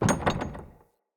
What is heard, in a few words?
Guarded Chest Locked B; Encounters; Mini Boss